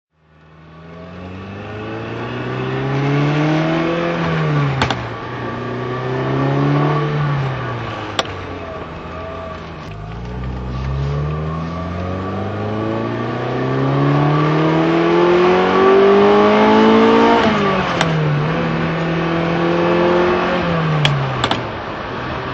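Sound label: ferrari mondial 8 drive, engine sound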